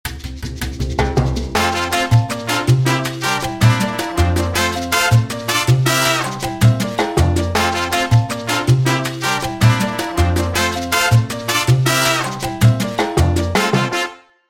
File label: Island Medium, Jingles; iLife Sound Effects